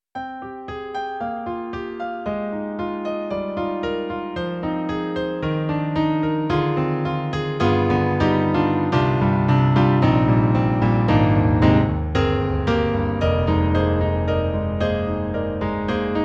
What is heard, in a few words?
Roland SonicCell Piano 2